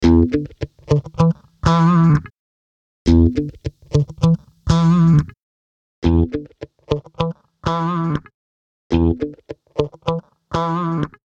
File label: GTR ORIGINAL EQ DRIVE